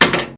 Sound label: Sling